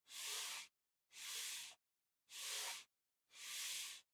PC-Mouse-Move (Far-Soft Pad-Long)

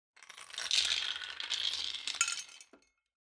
Drawing Machine Garapon, Few Spin Drop

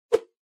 Motion Swish; モーション スイング

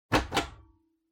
Ceiling Light Pull

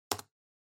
PC-Keyboard (Space-Hard)

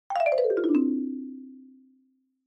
Xylophone Slow Long 1 Down

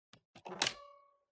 Optical Disk Drive (Open)

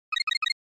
SNES RPG Magic Status

